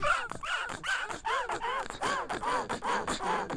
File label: Inflator Big Moving Loop; Hollow Knight